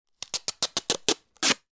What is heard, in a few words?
office parcel tape rip from roll